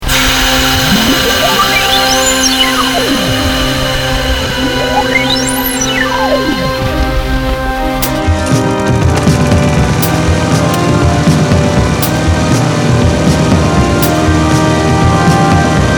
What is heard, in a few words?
instrumental